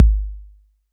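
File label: bass drum 808 Decay C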